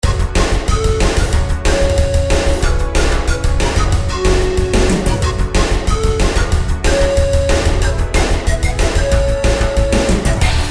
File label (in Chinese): 战斗 森林